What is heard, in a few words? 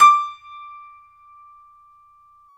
PNO, Piano Layer